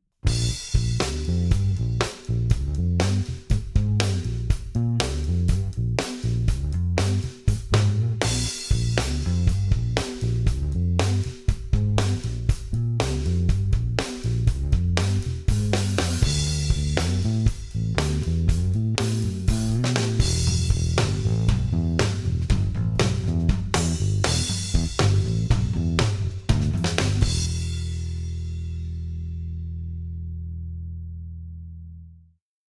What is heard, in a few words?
RnB Standard